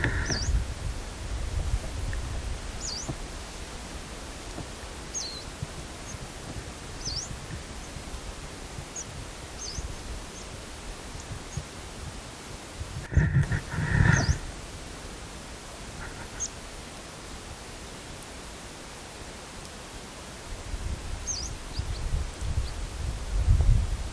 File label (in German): gelbbrauen-laubsaenger